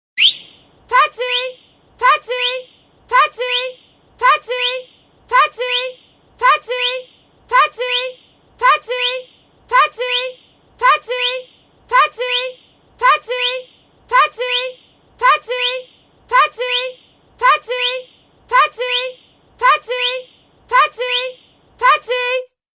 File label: ringtone